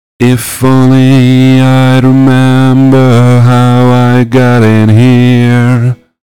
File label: song clipping